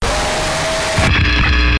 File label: killed; fnaf; golden fredbear